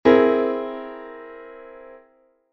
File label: chord